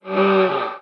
gazelle; Immortal

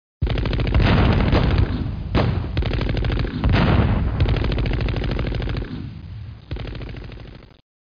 Bat; Stories from the Warsaw Ghetto (1998)(Montparnasse)

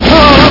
oooww; arnie; Amiga 8-bit Sampled Voice

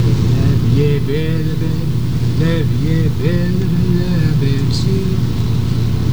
singing while working

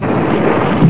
Interface Slide Down; pyramid

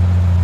Light Armored Idle; 1944 Battle Of The Bulge

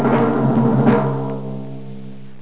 tymp roll

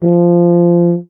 Tuba F4; DirectX SDK; DMusProd

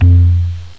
conga drum, percussion instruments; Sound Effects for Multimedia - Volume 1

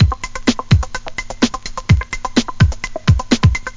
breakbeat; World of Sound; instruments; beats

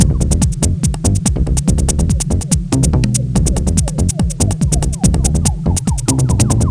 Theme

